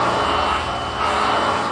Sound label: engineroom duplex loop; LOOPING AMBIENCE